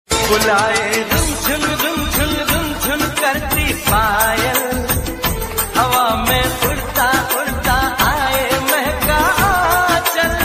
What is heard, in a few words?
Old Ringtone